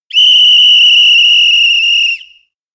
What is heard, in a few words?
whistle long; anemoi